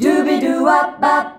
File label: DUBIDUWA D, FEMALES, Hyper Dance